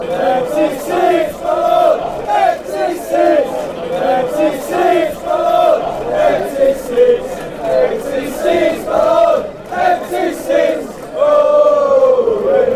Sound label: empty seats, chants